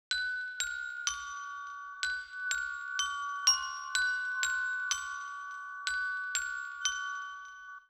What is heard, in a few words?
Glockenspiel 16 beats